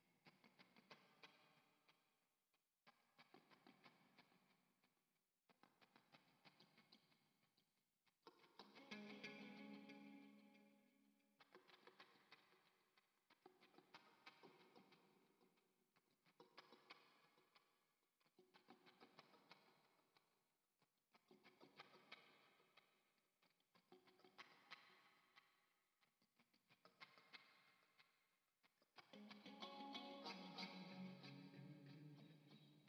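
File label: guitar Heavy Delay; GTR 3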